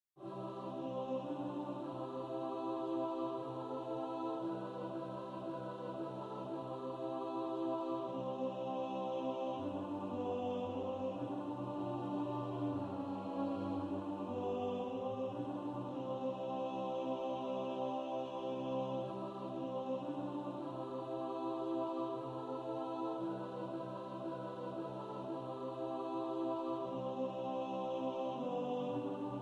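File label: alto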